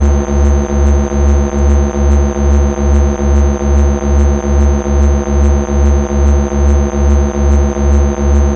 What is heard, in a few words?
deep